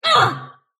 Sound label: sylph pain; dota; dark willow